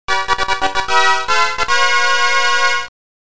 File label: Bonus Round Start; Mappy; Sharp X1